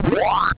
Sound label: ghost eat; pacman